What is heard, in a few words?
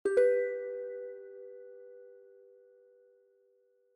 correct